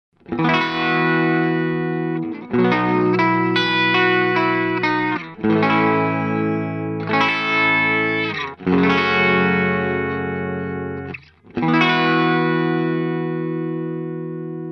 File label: Korg NuTekt TR-S Brighter Setting Low Gain Single Coil